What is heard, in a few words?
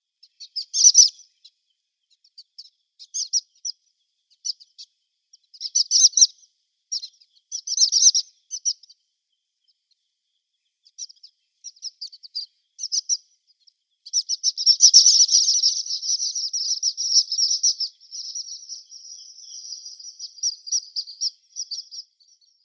Orange-cheeked Waxbill calls